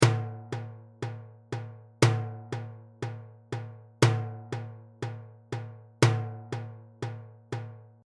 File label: Rhythm Example Downbeat